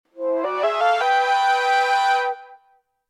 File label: Harmonized